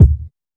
reverb kick one shot d key

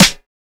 nice steel snare drum c sharp key

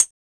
Low Treble High-Hat G# Key, closed hi hat

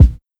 tight lows kick single shot b key

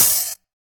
wet open hat sound c key

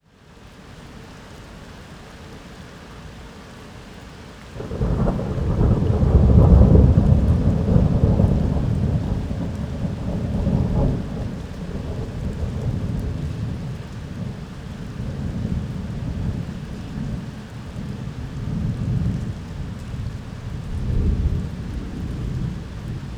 Pretty heay rain and loud thunder sounds; rain and thunder noises; sound effects

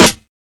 2000s Sparkly Snare D Key; snare drum samples

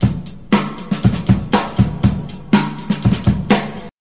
120 bpm drum loop e key

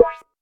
dark sounding acoustic snare a sharp key

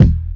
fat kickdrum d sharp key; kick drum samples; one shots

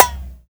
snappy steel snare drum b key

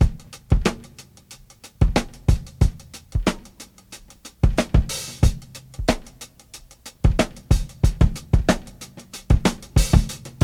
92 bpm breakbeat c key

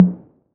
mid tr 808 electronic tom sample f sharp key; tom drum samples

